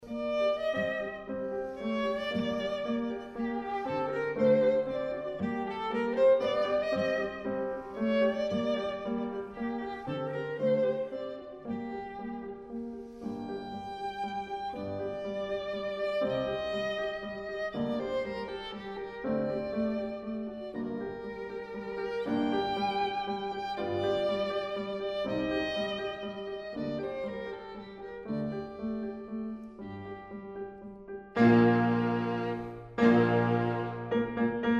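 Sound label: piano trio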